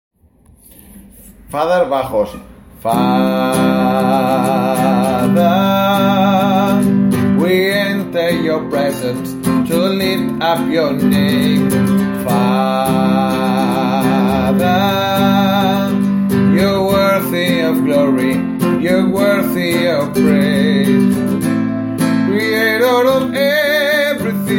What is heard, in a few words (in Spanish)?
father voz baja